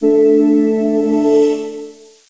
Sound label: WELL RTN